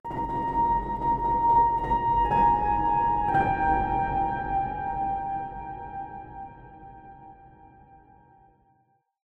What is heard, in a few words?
Atmospheric Mystery Piano